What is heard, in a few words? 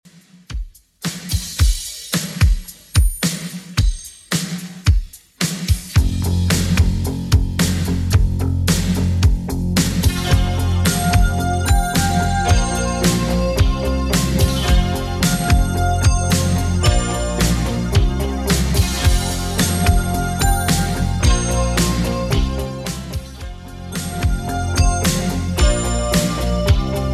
80s 110bpm; Redrum